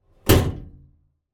door metal meter cupboard external close mic inside cupboard; household; zapsplat